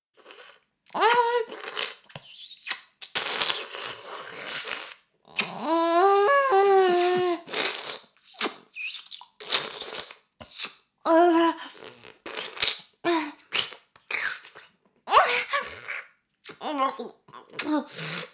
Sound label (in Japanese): 耳舐め音声, ASMR; 少尉; 牧場戦腺